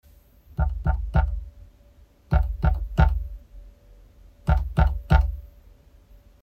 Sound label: mouse noises